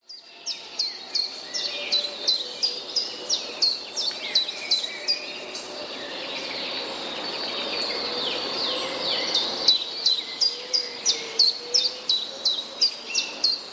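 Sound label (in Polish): pierwiosnek, nagranie śpiewającego pierwiosnka. Pierwiosnek śpiewał w lesie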